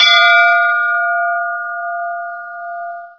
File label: brass bell f; ambient; Source Engine; cstrike